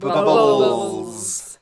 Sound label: Fish Sounds